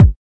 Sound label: kick